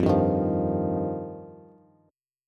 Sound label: F 7th Suspended 2nd, Bass; F7sus2; strummed; JGuitar